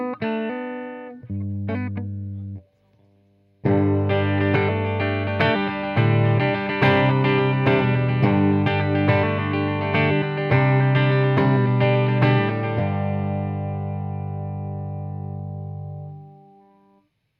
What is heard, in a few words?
disto oh oh oh; brunetti pirata141